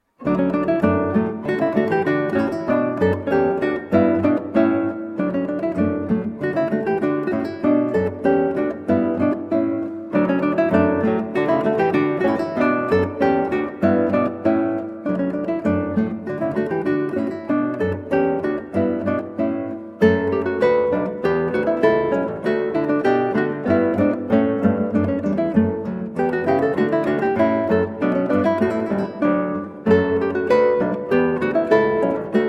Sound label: Gavotte from Trio Sonata in D Major, Guitar; HWV 397; George Frideric Handel